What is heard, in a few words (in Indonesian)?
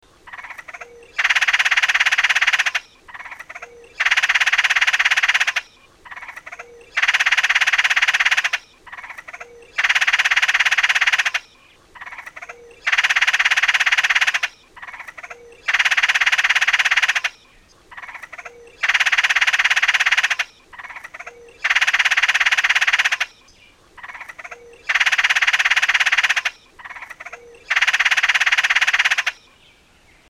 suara pelatuk palamerah